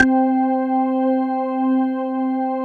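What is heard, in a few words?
amp organ C4; Big Fish Audio; Synth City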